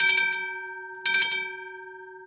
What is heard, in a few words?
tram bell; best service Blue Box